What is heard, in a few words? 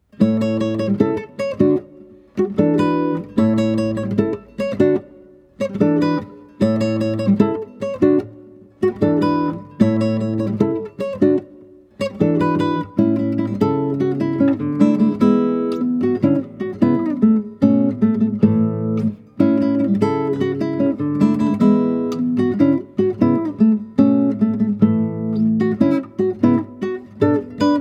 Ground Background Music, guitar; Super Mario World 2 Yoshi's Island; super mario series for guitar 2010